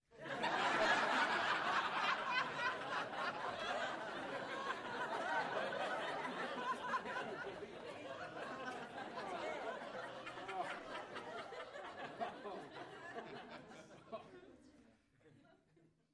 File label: Crowd Hearty Int, small int